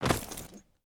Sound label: body impact soft